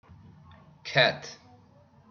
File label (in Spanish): gato